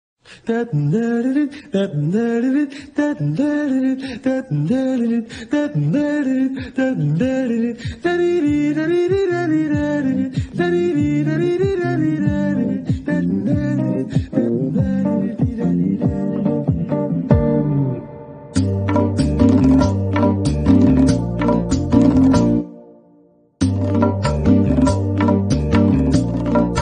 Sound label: Gilaki Instrumental